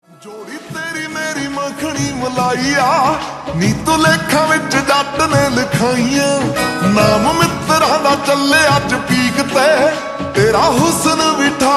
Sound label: Slowed Reverb Ringtone